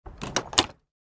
Vehicle Supply Truck Lift Close Rear Gate Military